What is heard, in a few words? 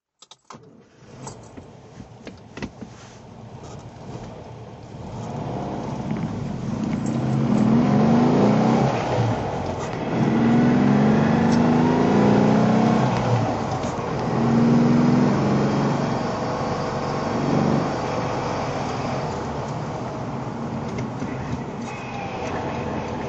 porsche 356sc drive